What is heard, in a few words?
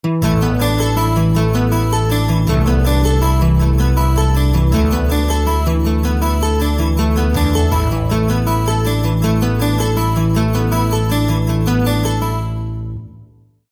Ovation; samplefarmlite